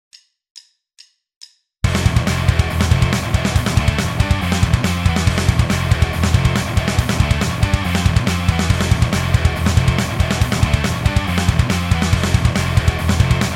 riff

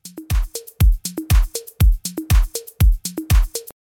parallel saturation drum loop

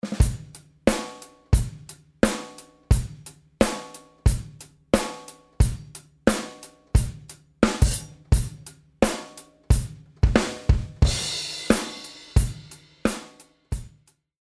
drum loop dry

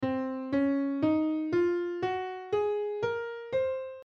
locrian mode piano scale

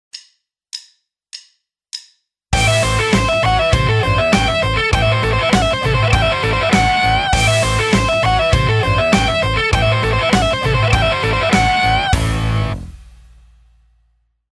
sweep picking rock lick

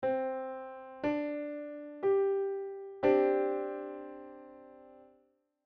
Csus2

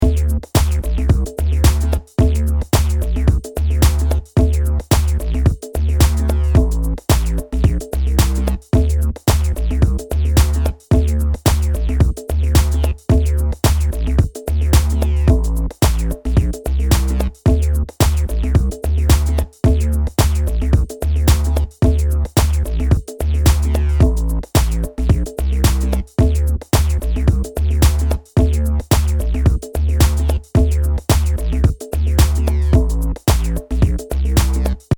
Dance drums 20ms

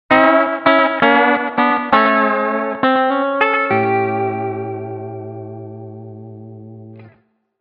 play with a vibrato

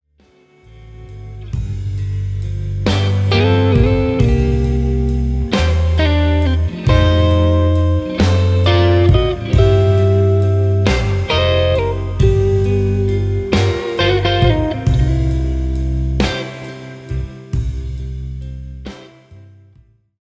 blues turnaround solos

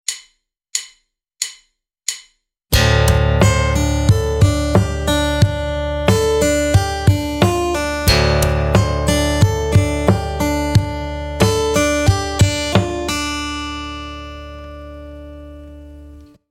percussive acoustic guitar

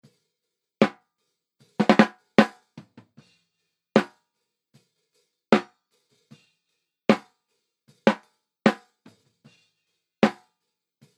drum snare send

